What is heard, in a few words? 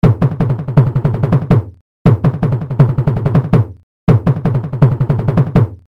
Indian Percussion Kanjira Khanjira Gganjira Tihai Kotekan; Syntheway